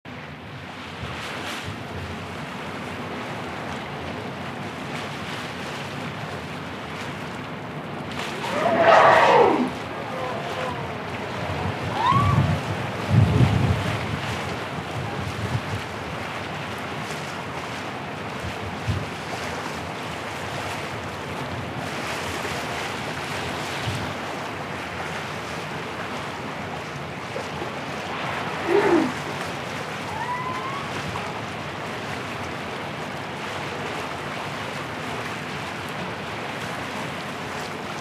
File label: Humpback calls from ship deck Ross Sea; Ross Sea 2025; expedition blog calum turner